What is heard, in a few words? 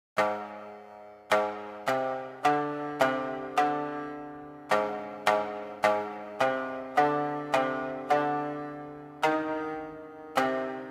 Requiem, Folksong; B Path of the Teal Lotus